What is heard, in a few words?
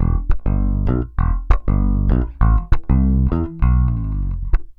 BAI BS EM, BASS BAION